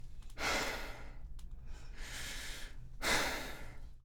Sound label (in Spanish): Sonido Suspiros